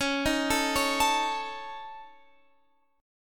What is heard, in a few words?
C sharp Suspended 2nd Sharp 5th, C sharp